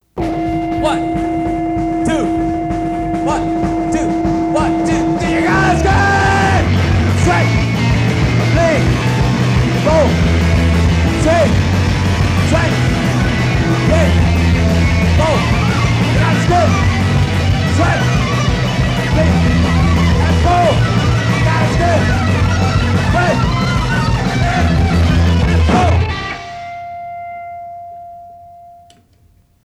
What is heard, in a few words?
Scream Sweat Blled and Bowl